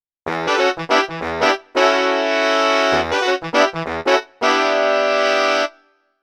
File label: RnB Funk Section, Pop Brass; PC3